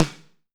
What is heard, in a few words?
snare